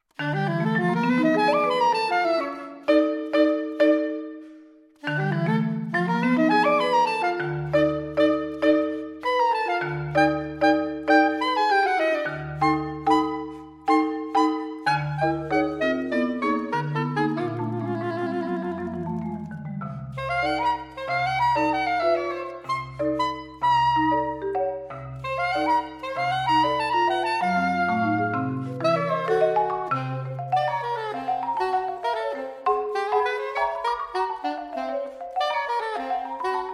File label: suite for saxophone and percussion allegro animato